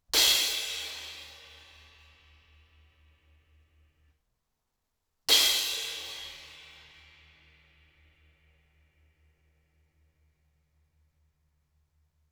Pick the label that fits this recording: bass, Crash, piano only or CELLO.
Crash